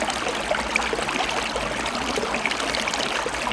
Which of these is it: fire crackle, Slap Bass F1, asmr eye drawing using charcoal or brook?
brook